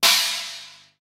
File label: cymbal b key